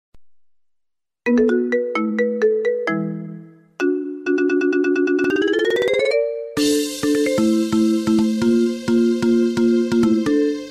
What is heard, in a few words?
marimba ios remix ringtone